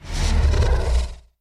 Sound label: ghost idle; soul sanctum(old raid) ghost sound